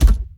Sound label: spin button; silverback